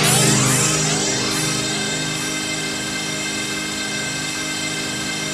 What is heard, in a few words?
ATMOPAD; Best Service; XX Large; Pads